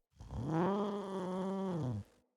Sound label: Kavat Vocal Idle; Warframes; Khora